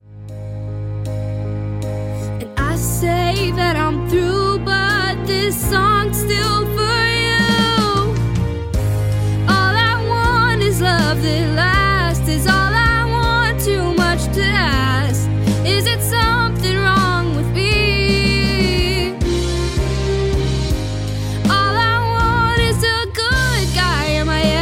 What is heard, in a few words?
Demo chantee